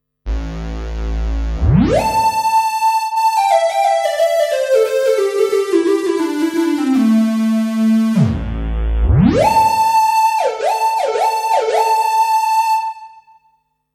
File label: Old Portamento